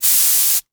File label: Hydraulics; Motors & Switches